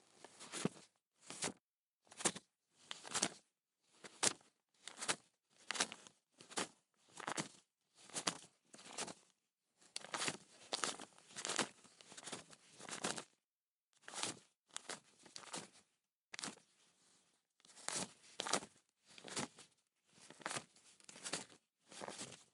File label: Counting 5 Bills